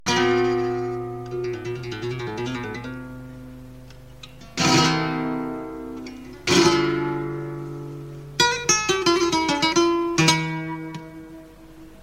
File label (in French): taranta de Basilio, chant, guitare; "De quien será este pajarito..."